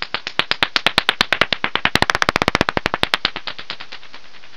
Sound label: echolocation